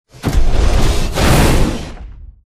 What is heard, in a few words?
melee walk punch; Titanfall 2